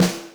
snaredrum, Yamaha RM 50

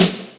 Snare, Yamaha RY-30 SNARES

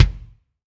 Kick Room

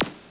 Zap; Sound FX

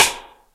Rim Shot, Roland D-70, Drum Samples

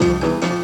Odd Loop b, Sound FX

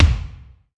Kik Hall, Bass Drum Kicks

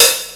Hat Open, Roland D-110; Drum Samples

